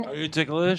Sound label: ticklish